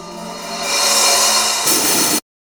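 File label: open hi hat; E-Lab; X Static Goldmine 1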